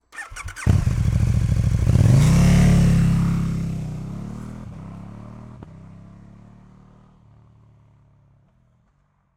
KTM Super Duke R EXTERIOR Turn On Start Idle Drive Away Accelerate Fast Speed, V-twin 1301cc; KTM 1290; 2016; ORTF; Dramatic Cat